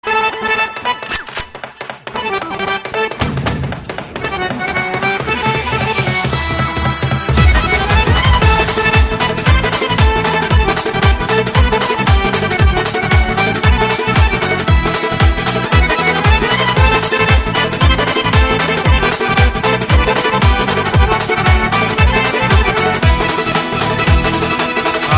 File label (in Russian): dagestanskaya lezginka